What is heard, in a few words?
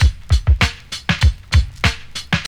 98 bpm 00s drum loop sample f sharp key, kick tuned to the F# note